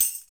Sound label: PER TA, Percussion Tambourine